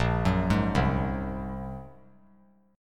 A sharp 7th Suspended 2nd Sharp 5th; A#7sus2#5 arpeggiated; JGuitar

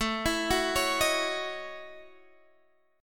Gb Minor 13th A

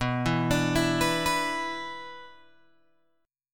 B-Minor Double Flat 5th, Guitar Open G

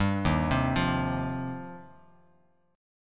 D sharp 6th Add 9th D sharp Bass Standard; arpeggiated; JGuitar

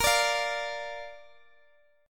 A sharp Major A sharp down Guitar DADGAD, chord

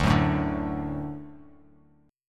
D Major D down Bass Standard; D strummed; JGuitar

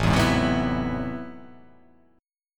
A Minor Major 13th, down

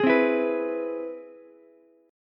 C Augmented Major 7th C down Ukulele; strummed; JGuitar

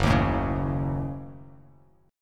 Gb Minor 6th, Bass Standard; strummed; JGuitar

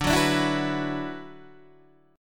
D 6th Add 9th D, Guitar, Open D

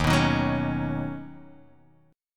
D sharp Minor 7th Sharp 5th D sharp, down; D#m7#5 strummed

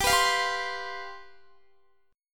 G 11th G, Guitar; strummed; x,22,21,22,19,19; 4E,4A,5D,5G,5C,6F

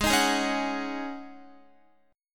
Ab 7th Suspended 4th Ab down Guitar

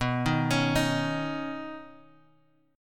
B Major Add 9th B Guitar Open F; arpeggiated; 11,10,13,10,x,x

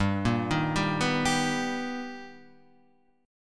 B Augmented Major 7th G, chord

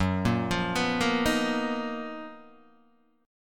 F sharp Major 13th Guitar DADGAD

